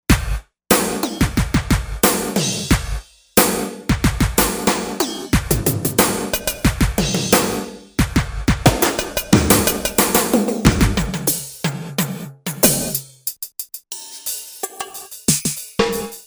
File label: Big Drums, Korg M1Rex; Factory Patches